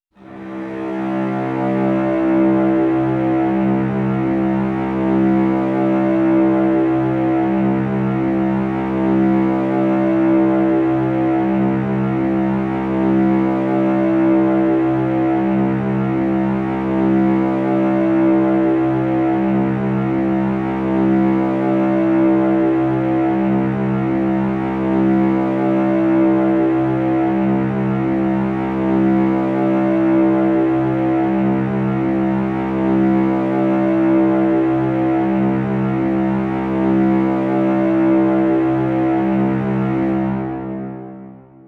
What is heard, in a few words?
one note C